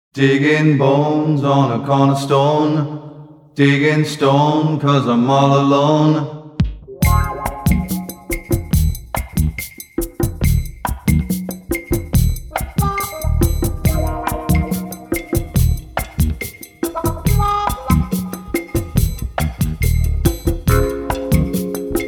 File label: temple jazz diggin bones